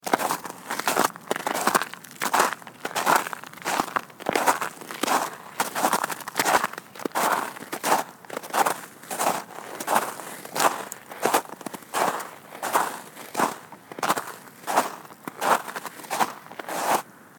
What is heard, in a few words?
footsteps in snow sound effect